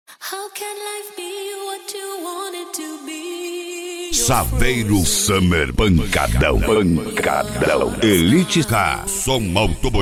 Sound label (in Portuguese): som automotivo; elite car